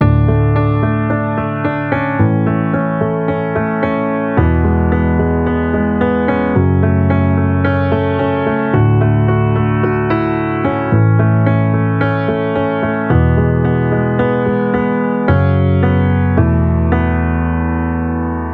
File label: Pop Piano Intro, A Maj (F# Min), 110 BPM; ultimate vibes midi pack 2